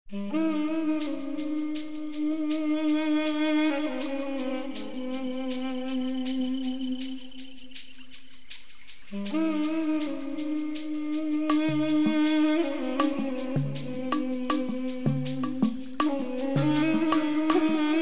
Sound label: low music